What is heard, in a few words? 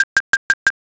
calculator